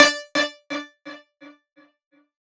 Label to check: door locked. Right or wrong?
Wrong